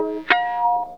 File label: WAH OVERT, Funk Gtr & Wah; PlatinumPhattCD2; E-MU Producer Series Vol. 8 – Platinum Phatt